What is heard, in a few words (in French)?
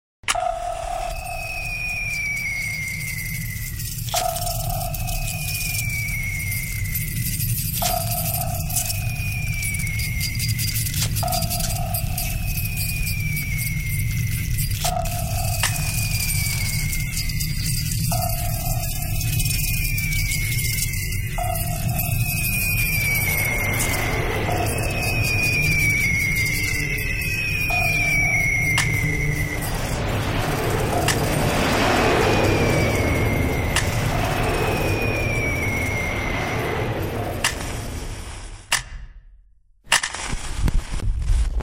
bruits d ovni